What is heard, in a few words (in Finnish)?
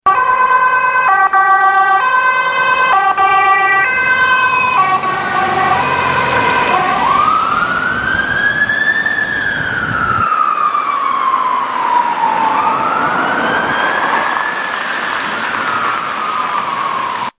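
ambulanssi piipaa, ambulance siren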